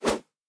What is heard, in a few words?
remove notification